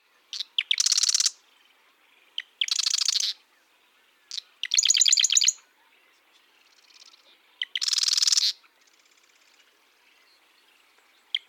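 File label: East genes mixed songs, Marsh Wrens, Normal songs; Donald Kroodsma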